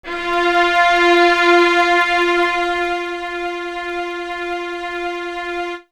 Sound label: Strings; Coldcut's Kleptomania VOL1